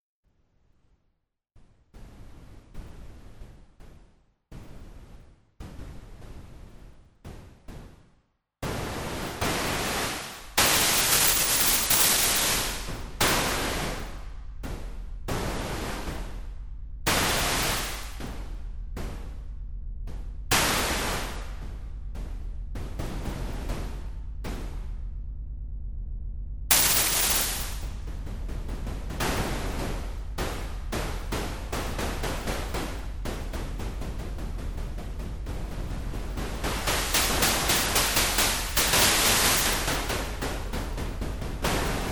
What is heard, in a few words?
noise